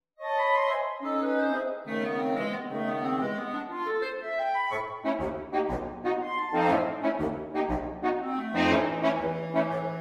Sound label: Jig; 2 Bb clarinets, 2 horns in F and 2 bassoons